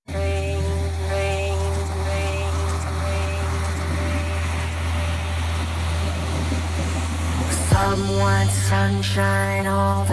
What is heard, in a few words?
Radio Dance Mix 9A 126 Vocal House